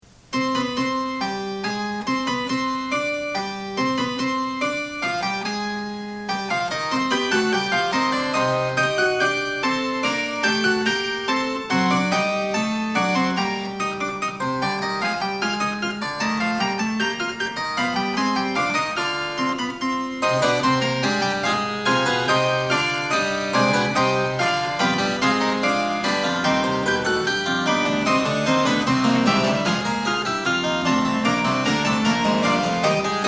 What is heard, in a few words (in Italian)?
fuga; bach; bwv847